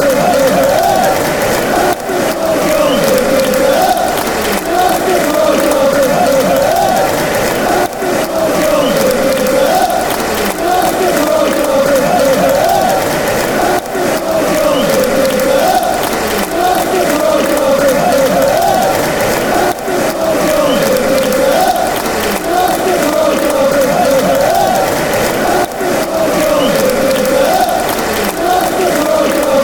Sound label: fanchants; kasper schmeical